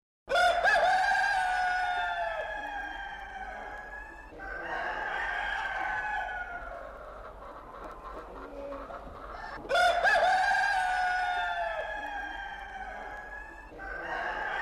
Zwa Kokkoras

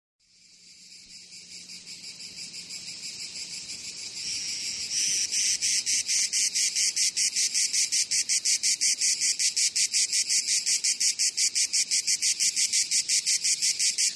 Zwa Tzitzikia

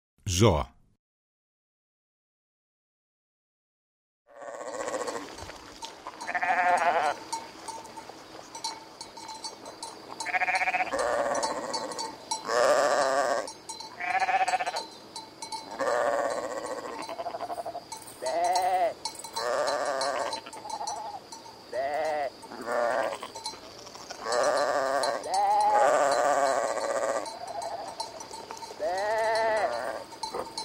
Zwa Provata

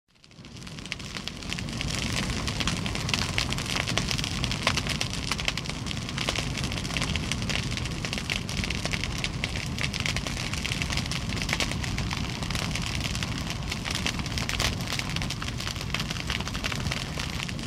Perivallon Fwtia

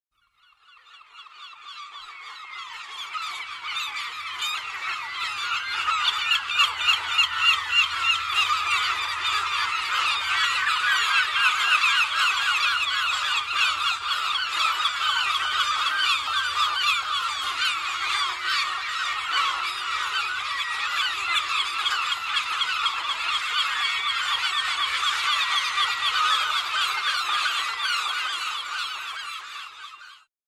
Zwa Glaroi

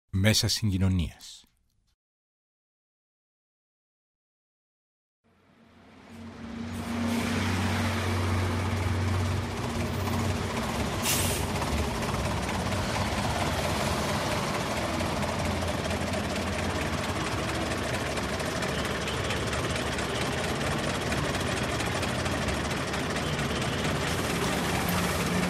Mesa sygoinwnias Lewforeio

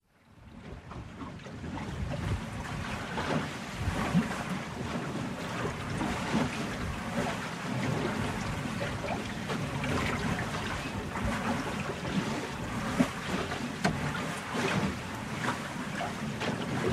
Mesa sygoinwnias Varka

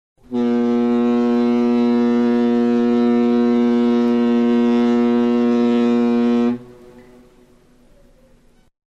Mesa sygoinwnias Ploio

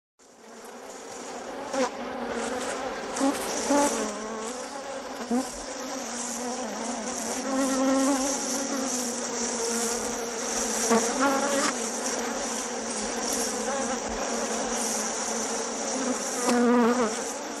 Zwa Melisses

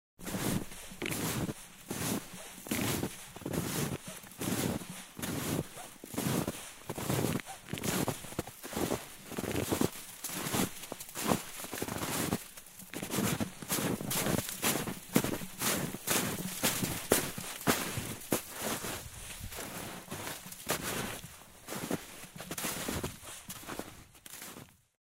Bhmata Sto Xioni

